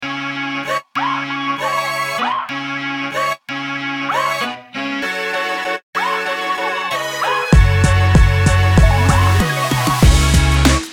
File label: promo vlog opener hip hop